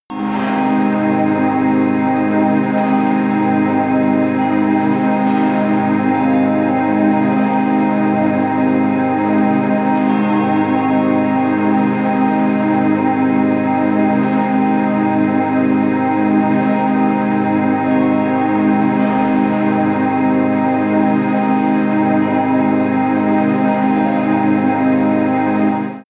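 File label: chrystal air, pads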